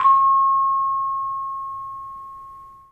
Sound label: vibes; 3D Sound; 3D Keys VOL-1